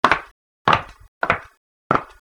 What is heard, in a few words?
fstep